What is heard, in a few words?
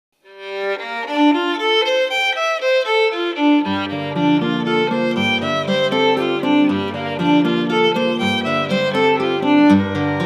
pop